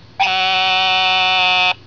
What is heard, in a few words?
eastern narrowmouth toad call; Texas; amphibian watch; texas nature trackers